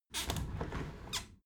window handle squeak turn open halfway soft; objekte; fenster

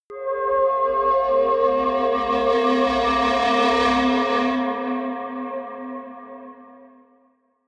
soft hum drone high, drones; musikelemente

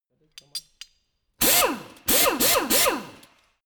impactor salve metal rattle; maschinen; bohrer

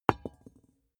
small metal drop clang soft; industrie wirtschaft; metall

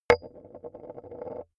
metal impact wood light rolling

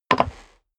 step dress boots wood creak jump; schritte; menschen

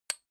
click glass generic, signale

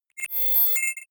confirm swell click; soundpool

